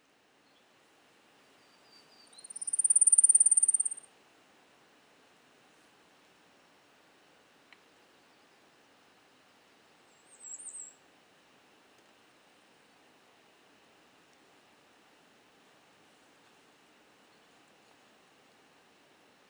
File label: Blackpoll Warbler Setophaga striata; banded birds; Mount Mansfield